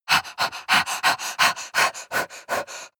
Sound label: breath female pant fast